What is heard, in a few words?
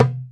arabic perc, Arabic Percussion Kit